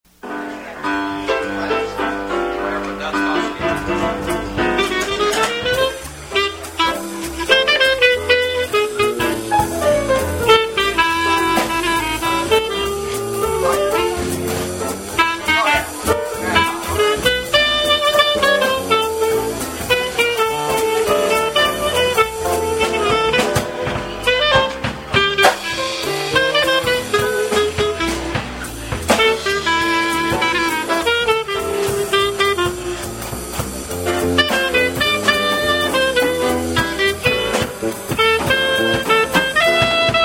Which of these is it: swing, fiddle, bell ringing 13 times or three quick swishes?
swing